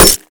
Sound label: bullet glass; weapons; Modern Warfare